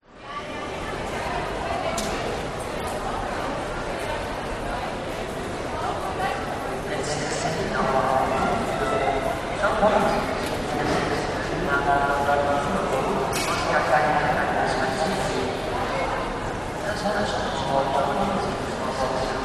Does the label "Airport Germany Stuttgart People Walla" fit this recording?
Yes